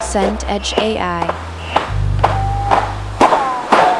crowd march footsteps

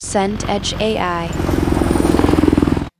helicopter pass

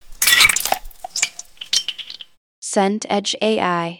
pour beer head

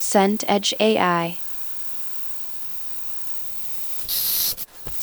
wind through window gap

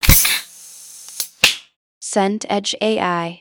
can open

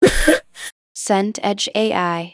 laugh single short

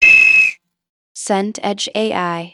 whistle referee

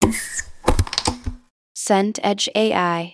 door fridge open

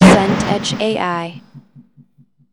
boom distant

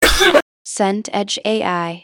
gasp surprise

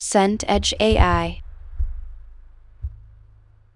plane overhead

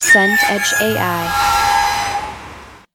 train brakes squeal

